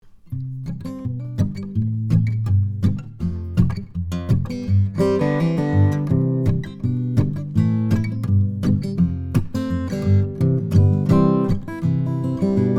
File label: Fingerpicking; Santa Cruz OM/PW guitar; Rode NT1-A microphone; V78M preamp; Sony PCM DI flash recorder